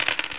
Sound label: more dice; ayacht